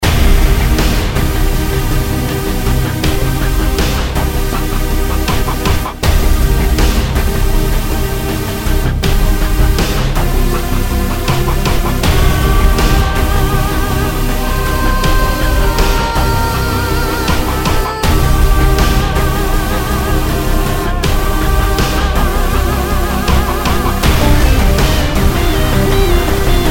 Raid; Mist Train Girls

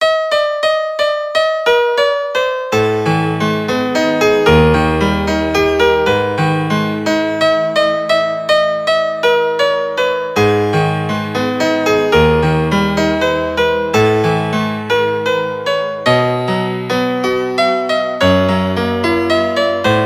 Piano Sounds in the Corridor; Cookie Run: OvenBreak OST Complete Collection 2022; 복도에서 들려오는 피아노 소리

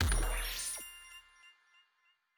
Power Anim Board Effect Tile Highlighting; Donald

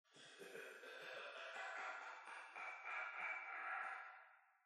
laugh; The Joy of Creation Halloween; Verblaugh

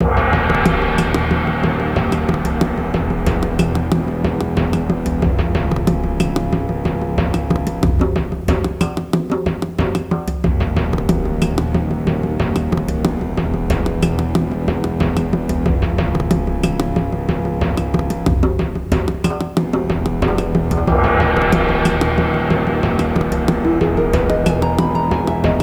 Music Mini Golf Dino

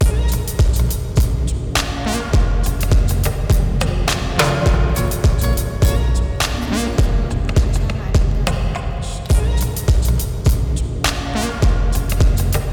Beat; NBA Live 2003; PlayStation 2

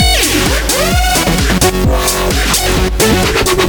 Electro

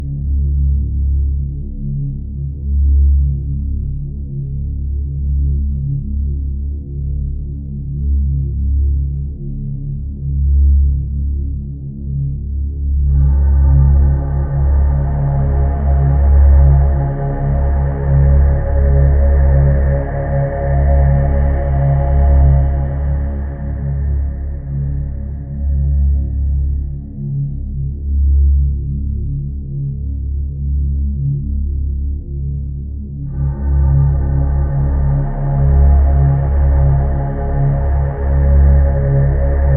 Drone; Discovering Space 2 VR

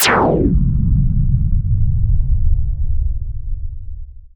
SPACE WARP; Type 03 Warp F stereo; Obscure: Challenge Your Mind

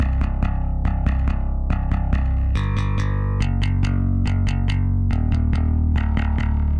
Drums and Jingles Explore Bass

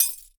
Shatter; Blastmorph Pinball; xbox series xs

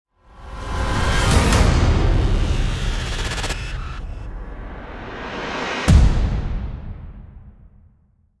Boss Start; Neoverse

